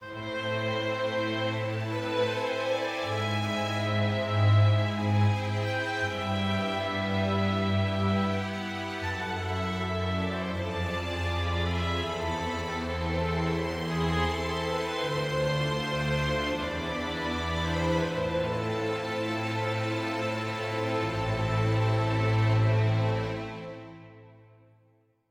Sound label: sad forte; Quivr; PS4 Windows gamerip 2016